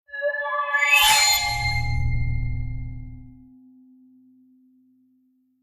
ending win; iDaggers; Android

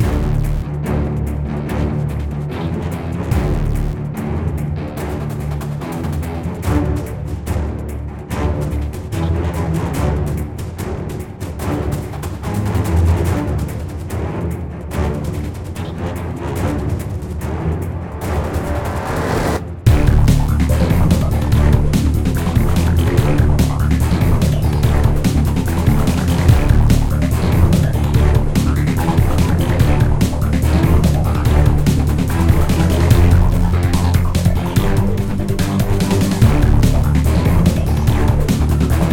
Action Time Looped; Dual Blade: Battle of the Female Ninja

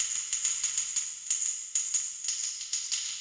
tamb

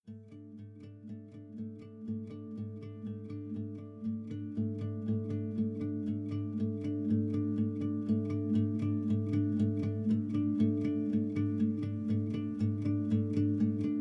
Savannah Music

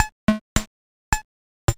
Space Boss Background; maestro jump in music

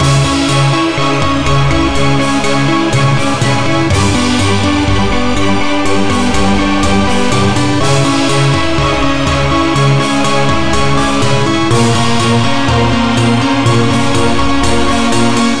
Danger Loop; Aces of the Galaxy; XBLA Windows Xbox 360

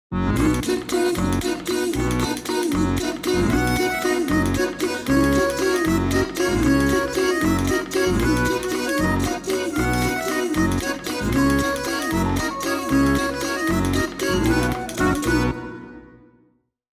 Accordion; Armikrog

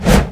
door swish; birthday party bash; wii; Spanish

